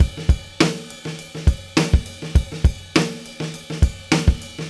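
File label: 102 bpm, beat loops; Zero-G; Monster Beats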